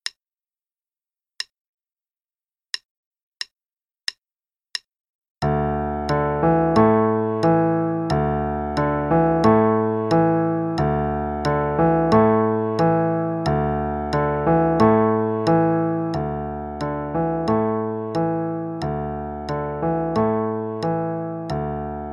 Improvisation (qn=90); Faber; Level 3A page 33 Canoe Ride

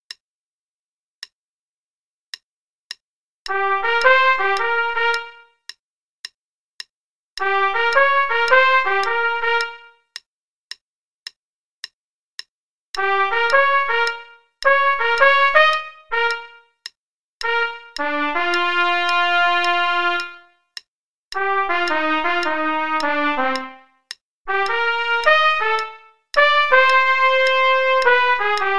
Play-a-long, Blues, qn=108

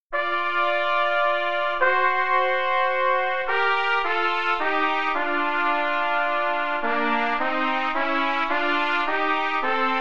Duets, qn=108, trumpet; Amsden Duets

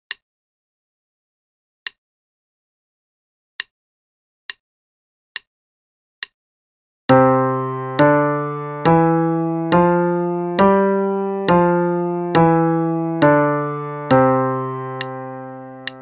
Exercise 5 Finger Pattern in C and G (qn=70); Play-a-long

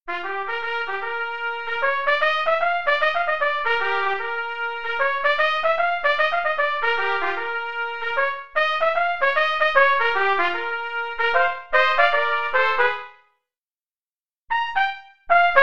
Play a long Jazz Trpt Duets; Adv Jazz Trpt Duets; hn=76; Duets (Jazz Trumpet)

